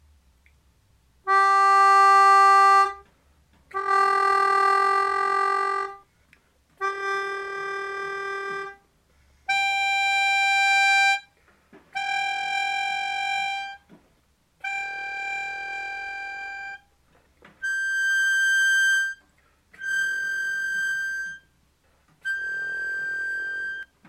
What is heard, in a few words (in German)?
flatterzunge, akkordeon